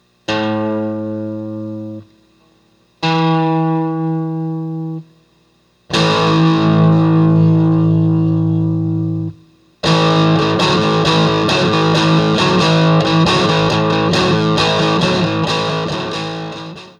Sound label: power chord